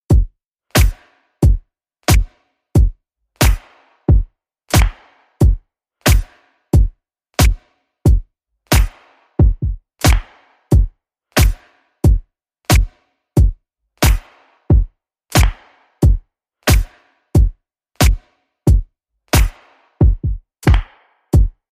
Whispers Beat

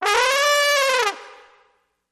Trombone Elephant Call

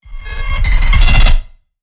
Glitched Out Whoosh

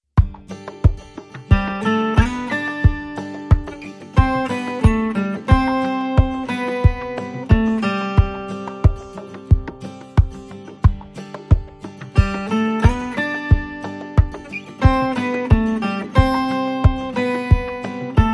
No Mandolin Strums No Glockenspiel

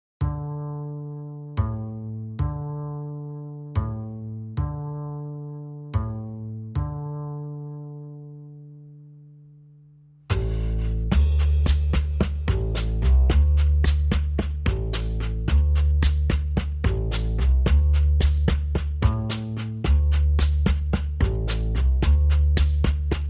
Drums and Bass

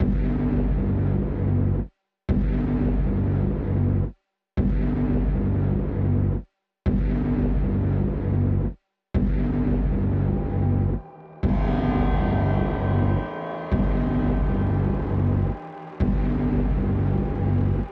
No Bass

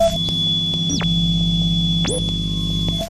Signal Interference Transition

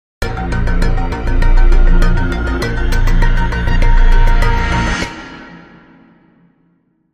Crime Sting, Short

